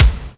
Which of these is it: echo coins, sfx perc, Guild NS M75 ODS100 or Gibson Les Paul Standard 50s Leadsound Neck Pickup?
sfx perc